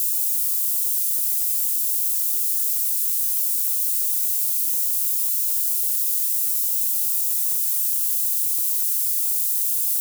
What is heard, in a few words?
noise hp